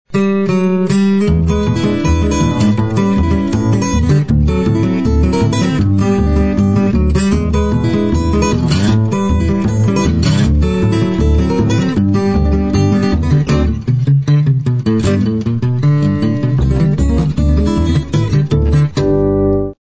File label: field theme